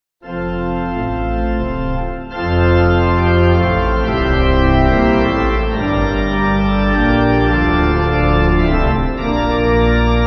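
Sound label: Pipe Organ; Communion; Dudman; Acclamations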